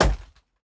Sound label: ice hit; teardown; ps5 windows xbox series xs